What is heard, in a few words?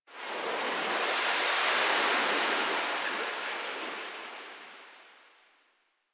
Wind One Off, ambience; Warframe; Sacrifice; Vitruvian Memory